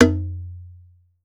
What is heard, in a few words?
EARTH TN, EARTH DRUMS; Voice Crystal - Steve Reid Definitive Percussion